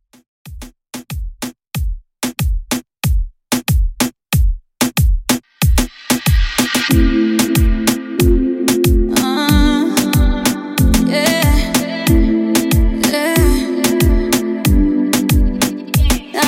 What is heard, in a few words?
Latin Reggaeton 93 Bpm